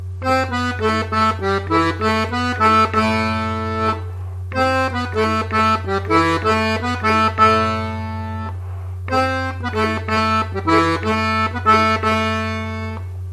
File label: swing